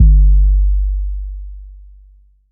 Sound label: phonk kicks oneshot - 808 g, Specially designed for phonk type beats, these nasty, layered 808 one; kick drum